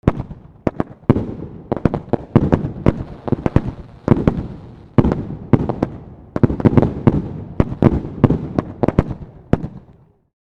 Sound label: Fireworks explosion distant perspective pops and crackles